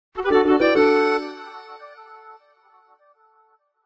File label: stinger key item small; sea of stars ost; solstice